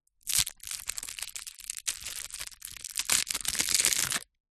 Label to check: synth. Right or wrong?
Wrong